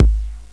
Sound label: BD Analog H; Drums; GMPlay; XG